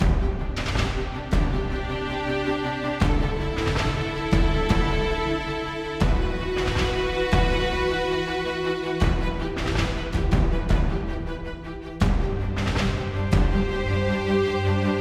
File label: Mood Mid